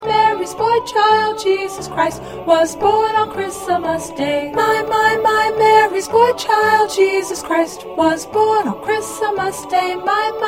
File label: Alto, choir